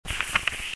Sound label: book; Monster girl quest Part1,2,3; Visual Novels